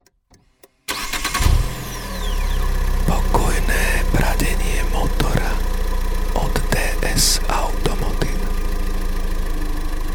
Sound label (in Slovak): pokojne pradenie motora; ds automotive